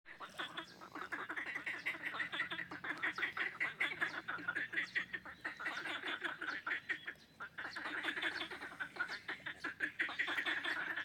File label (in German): Froesche Nordmazedonien Ohrid See; Balkan roadtrip 2024